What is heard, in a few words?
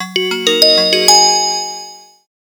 POWER ON, ui; Sharp AQUOS sense4 lite SH-RM15